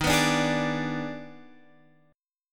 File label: D sharp Major 7th Suspended 4th Sharp 5th, Guitar, Open F; D#M7sus4#5; strummed